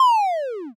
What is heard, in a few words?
shutdown